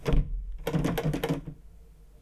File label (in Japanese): 開かない, ドアガチャガチャ; (立体)